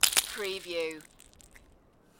Snow and Ice, Ice impact with ice fragments debris